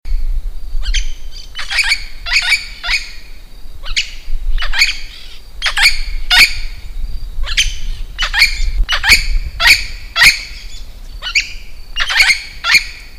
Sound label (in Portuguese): canto do periquito de encontro amarelo